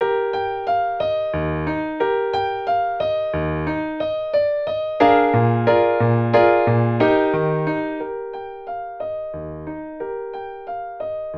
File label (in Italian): Pezzo per Pianoforte